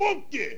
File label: funky